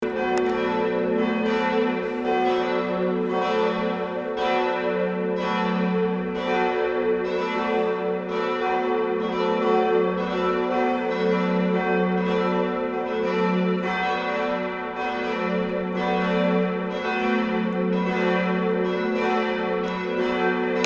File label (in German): Christuskirche Glocken